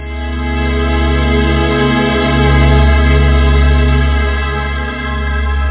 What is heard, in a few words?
space fade chord; musical